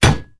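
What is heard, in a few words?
one hand Dagger Hit Wood Shield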